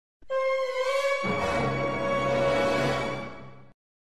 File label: Panic Suspense